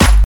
Clap Sample G Key, Royality free clap single hit tuned to the G note. Loudest frequency: 1053Hz; percussion; single hits one shots